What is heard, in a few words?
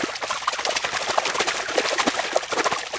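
spin loop; smite; kuzenbo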